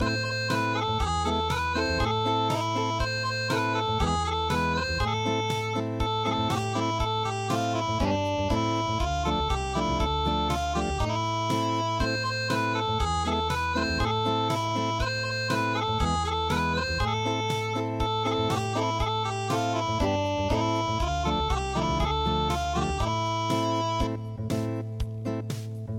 Unplugged, traditional; midi

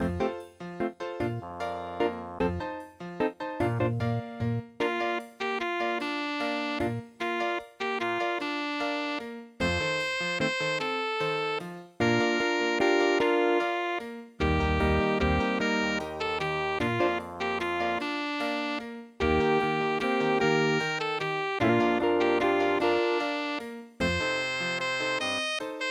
silent night jazz waltz

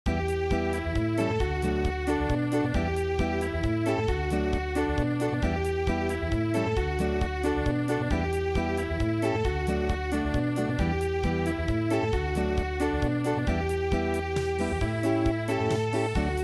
Unplugged

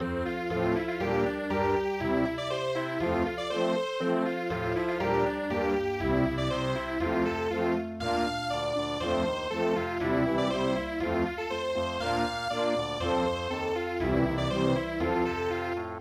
vienna waltz

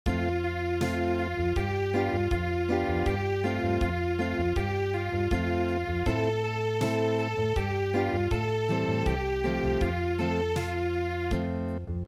Acoustic

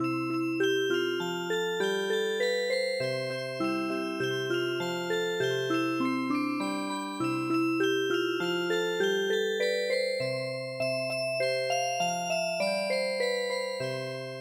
Music Box, traditional; Humpty Dumpty

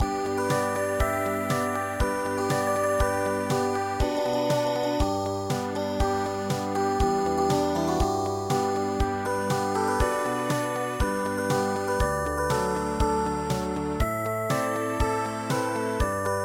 ballade

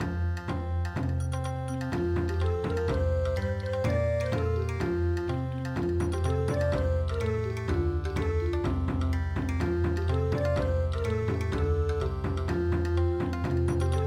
Bon Odori; dwarf muku

